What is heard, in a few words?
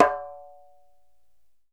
DJEMBE